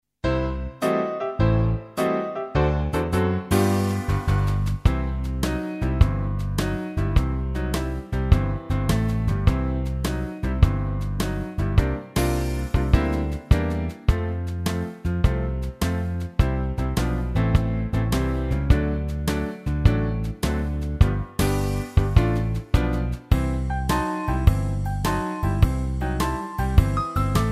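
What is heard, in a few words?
Ligado Blues, gitaar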